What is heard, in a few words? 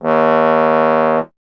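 bass trombone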